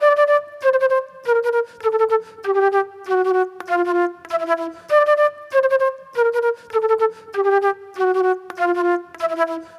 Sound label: Acid Jazz Flute